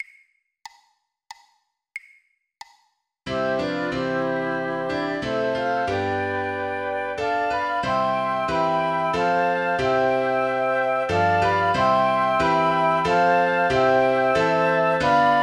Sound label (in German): Flöte Klavier; 92 bpm, 2x; The First Nowell; weihnachten